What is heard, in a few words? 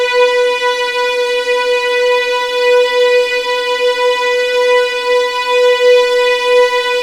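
B4 legato violins; East West; The Ultimate String Collection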